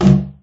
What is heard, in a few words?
plastic barrel impact hard; physics